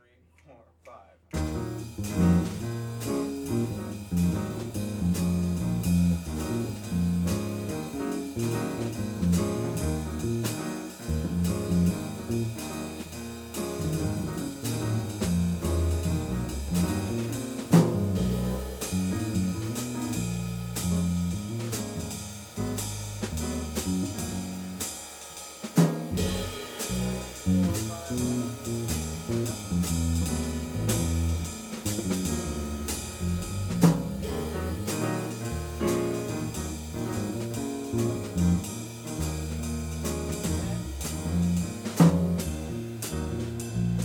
jazz

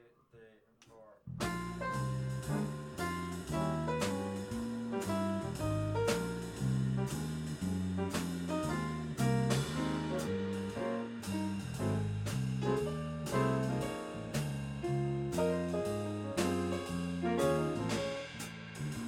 jazz mellow Trades

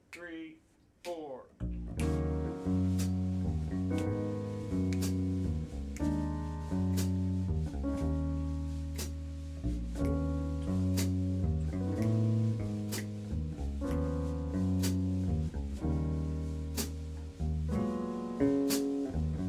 jazz

